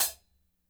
HAT, KLANKY KIT; Spectrasonics; Burning Grooves CD2

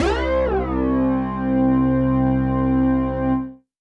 Synth C, Synth Prophet One Shots; Electronisounds

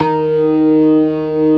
ORGAN SYNTH; InVision Interactive; Keith Emerson

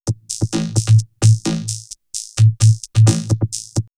Vocoder Loop; backtothe70's; X-Static Goldmine; VocoderLoops

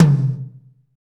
TOM, Roland; Northstar - Drumscapes Roland

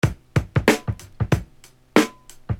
Beat, Hip-Hop Street Beats

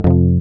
F, Acoustic; Sound & Vision; Gigapack I CD 2; GUI ACOUST.; GUI Acoustic 1

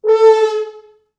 4 FrHorns FXMOD; East West - Quantum Leap Horns Sax; 90's Sample CDs

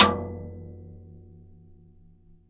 PAILA LO; CUBA

